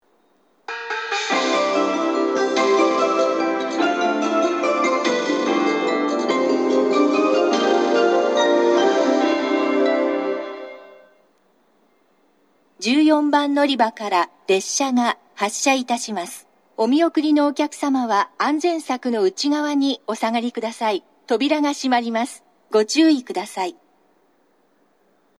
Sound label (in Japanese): 14番のりば発車メロディ＋発車予告放送, 長崎 西九州新幹線ホーム